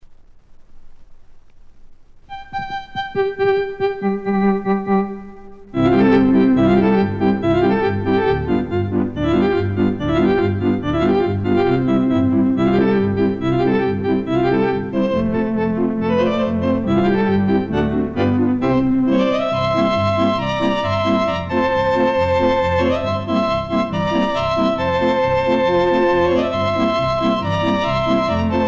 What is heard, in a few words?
Polka